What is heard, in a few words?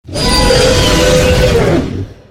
dragon attack; npc